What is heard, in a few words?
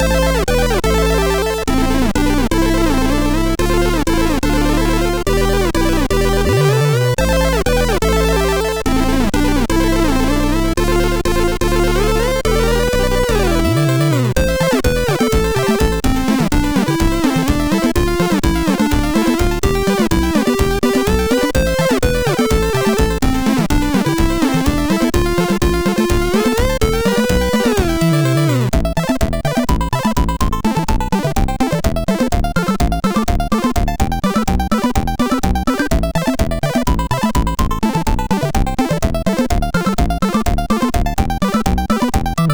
Load Line, sid MOS6581R4